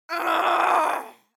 Woman cry of pain

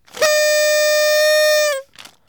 Party trumpet; buoi tiec

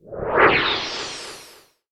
Fast sweeping transition; chuyen tiep

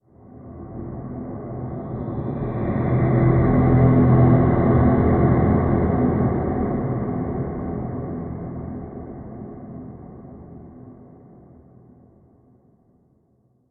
Spaceship engine whoosh working

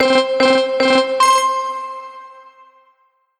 Arcade race game countdown; xe dua

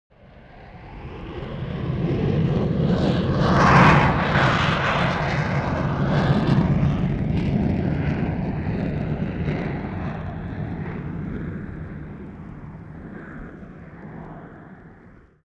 Space rocket passing by

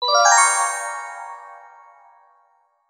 Horror bell cartoon transition; chuyen tiep

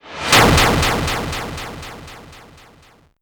Arcade game explosion echo

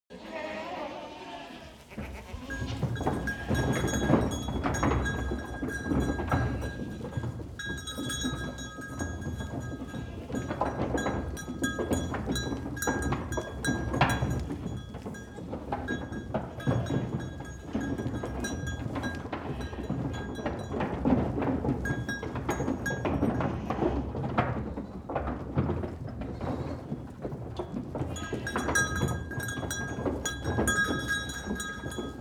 Goat herd move out

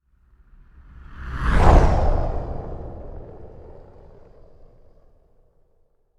Burning asteroide flyby

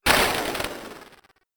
System break

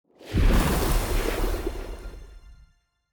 Medium healing spell; ao thuat

